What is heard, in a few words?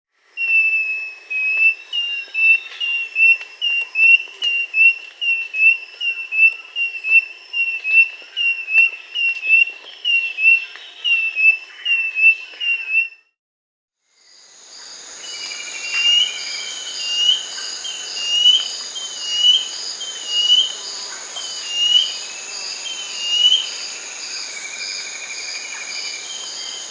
Cinereous Mourner (Laniocera hypopyrra), Peru, Birds of the Amazon; S. America